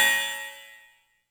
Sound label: Cymbals, Elektron MachineDrum; SPS1 MKII